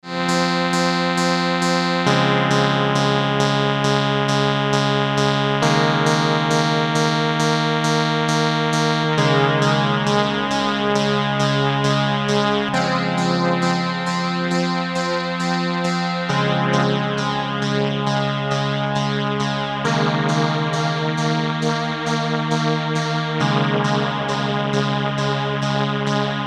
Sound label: Chorus modes; Xerxes